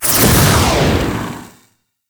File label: Tear Dissolve; Entrati; Swarm